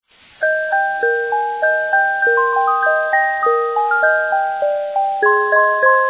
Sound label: Jingle Bells; Inventron